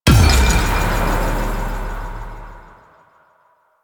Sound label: Hit React Quick